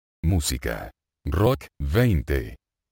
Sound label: rock